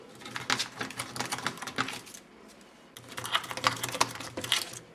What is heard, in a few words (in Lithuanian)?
nesusije su balsu nagais krapsto